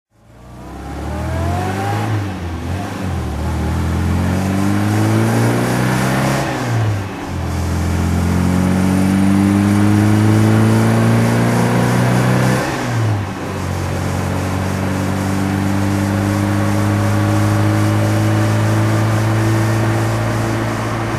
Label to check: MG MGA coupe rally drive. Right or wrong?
Right